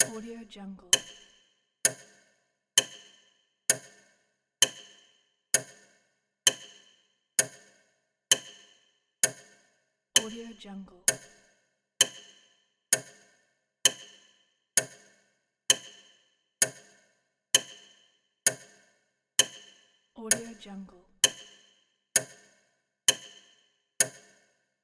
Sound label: ticking clock; style-life